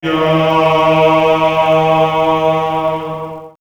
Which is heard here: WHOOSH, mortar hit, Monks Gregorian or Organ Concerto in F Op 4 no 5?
Monks Gregorian